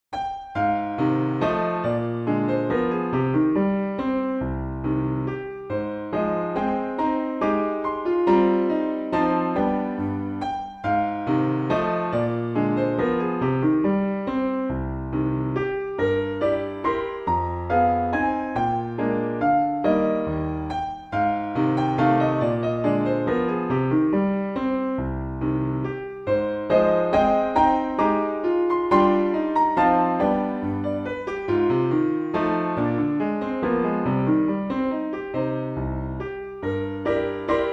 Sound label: waltz in e flat, piano